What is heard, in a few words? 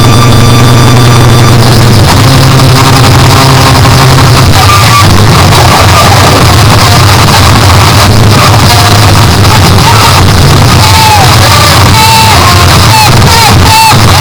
guitar noise; lolicore